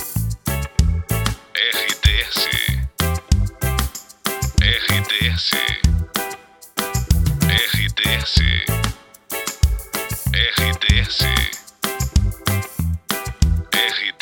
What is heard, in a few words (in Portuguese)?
TRILHA PARA LOCUCAO POPULAR